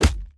punch; lingang; boxer

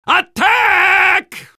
soldier battle cry; vo

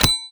doomsday warhead; Garry's Mod; tf2

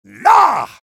heavy taunt exert; vo; taunts; tf2

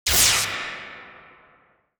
resistance medium; Garry's Mod; tf2

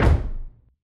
dog day stomp; dognap

